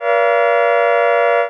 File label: dim7 planar